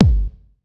2000s mid range kick drum g key; Duration 0:01; Samplerate 44100 Hz

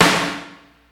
2000s roomy acoustic snare sample f sharp key; modern snare drum

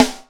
short acoustic snare sample c key

